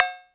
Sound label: Cowbell